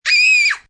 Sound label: Scream Kid Shriek, Scream, child, girl, short, high-pitched, fear, terror (human voice); Screaming Sound Effects